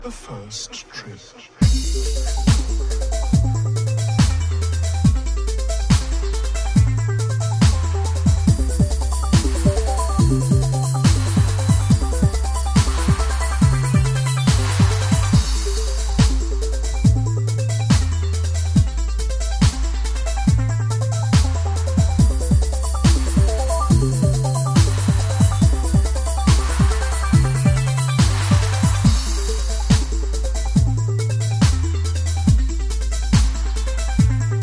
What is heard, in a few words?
DUBSTEP